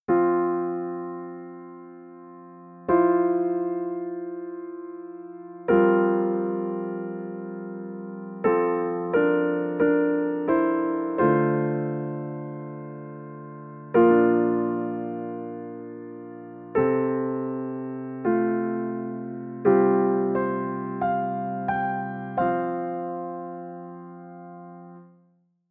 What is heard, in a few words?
melodic fills